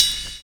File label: SNAP; Univers Sons - Kitch 70s